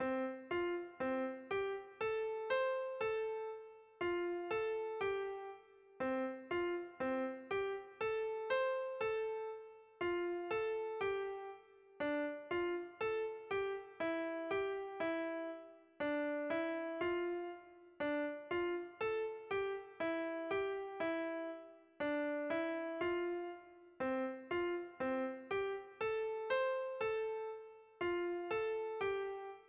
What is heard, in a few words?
melody